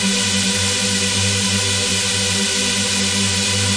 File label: hi energy synth loop